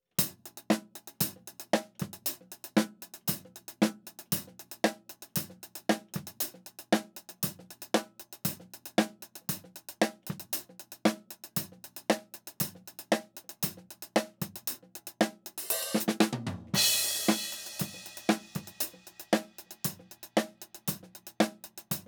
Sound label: Hat